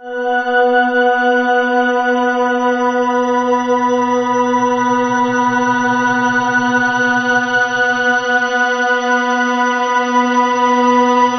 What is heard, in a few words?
HI FREQ, Ominous Drones; USB Soundscan vol.48; AKAI